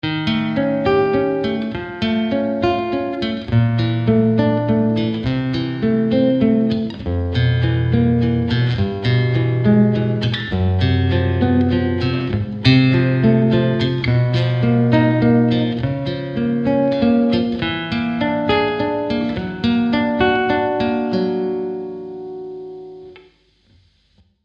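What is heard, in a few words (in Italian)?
Esercizio, Chitarra sola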